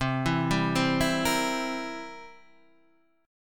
B sharp 7th B sharp; arpeggiated; JGuitar

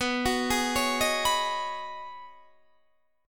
B 6th Add 9th B Guitar DADGAD, chord

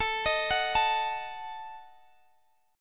Gb Minor 6th A

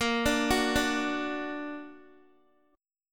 Bb Augmented Bb Guitar; arpeggiated; x,13,14,11,14,x

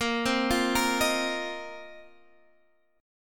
Bb Suspended 2nd Suspended 4th, Guitar, DADGAD

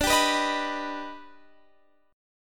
D sharp Augmented Major 7th D, chord

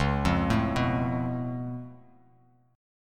Db Augmented 7th Db down Bass Standard; arpeggiated; JGuitar

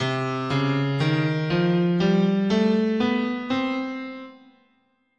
C Neapolitan Major, scale

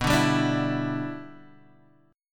E Minor 7th B

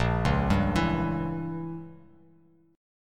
A sharp Diminished 7th, Bass Standard; arpeggiated; JGuitar

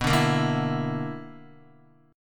B-Minor Major 11th, Guitar; BmM11; strummed; x,2,2,3,2,0; JGuitar

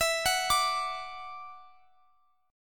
D Major Add 9th E, chord; Dadd9/E; arpeggiated

